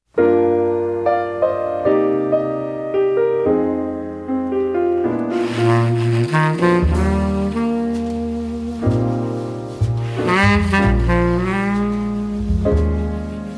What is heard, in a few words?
Jazz Singer, Trio; New York Jazz Singer